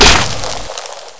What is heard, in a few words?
grenade blow, weapons; XDM